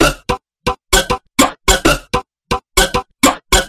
batida de prato

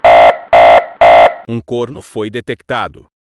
sirene corno detectado

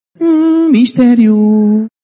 hm misterio

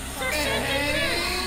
eeeeeeeeeeeeiiiiinnnnnnnnn; ratinho

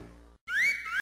vinhetas radio, assobio radio; radio globo anos

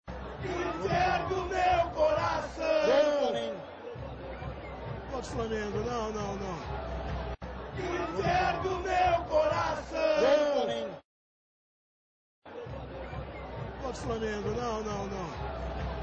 choro

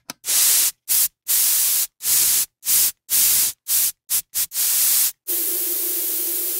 jato de spray

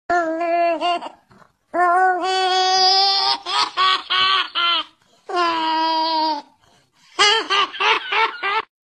risada perversa

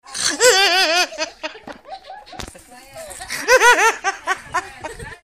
risada do doido; zoeira

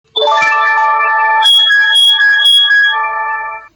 toque

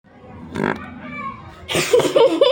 peido

